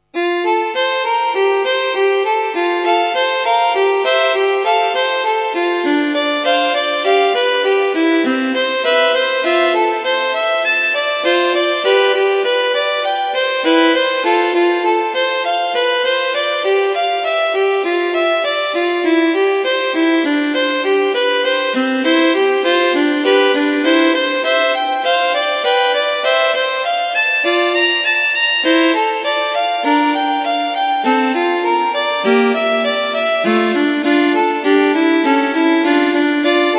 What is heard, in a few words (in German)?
praeludium f dur; nach bach